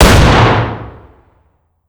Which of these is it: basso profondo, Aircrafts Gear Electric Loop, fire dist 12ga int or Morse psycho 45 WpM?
fire dist 12ga int